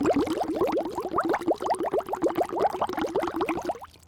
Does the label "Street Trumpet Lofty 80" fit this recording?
No